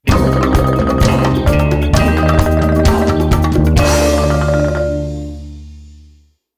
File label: Match Lose; Punch-Out; wii; Donkey Kong